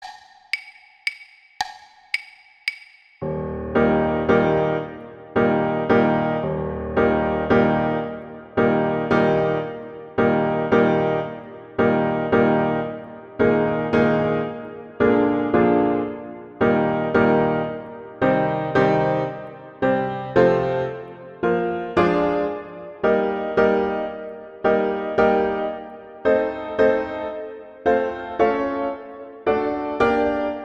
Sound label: Allegretto pomposo, Elephant; Saint-Saens